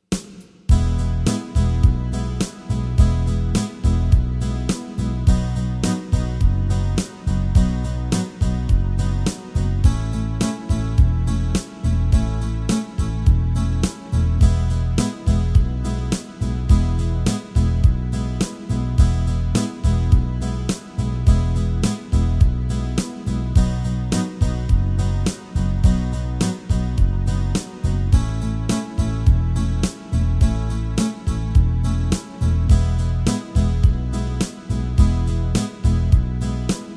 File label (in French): E I V IV I, guitare